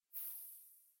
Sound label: spider